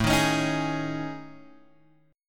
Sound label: A Major 7th Flat 5th, guitar; AM7b5 Chord {5 6 X 6 4 4} strummed